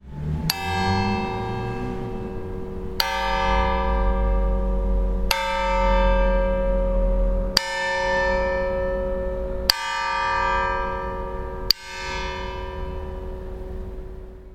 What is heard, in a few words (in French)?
harmoniques